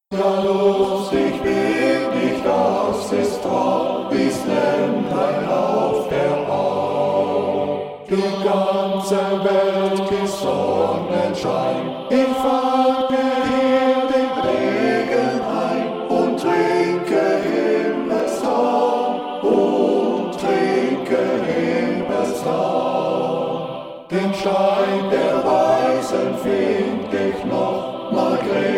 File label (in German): a capella; ja lustig bin ich; ttbb